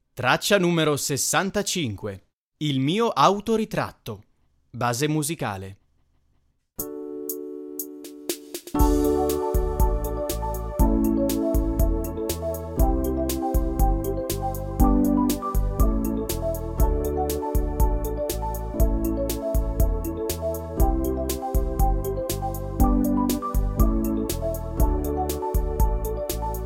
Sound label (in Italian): base musicale; infanzia